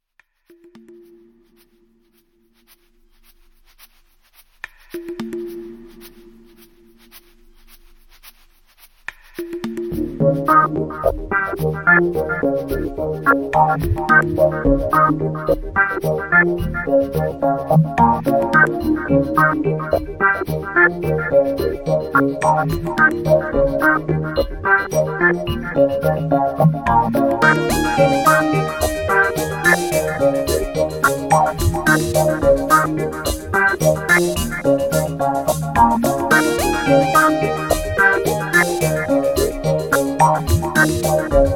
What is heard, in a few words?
Trance